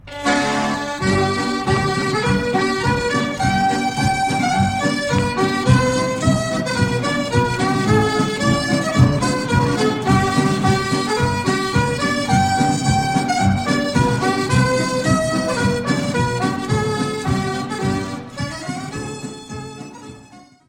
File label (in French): Marche - Ecosse